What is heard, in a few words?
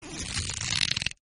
entangle sound; Arc of Templar